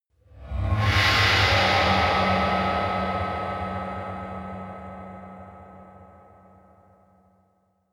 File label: whoosh scifi swoosh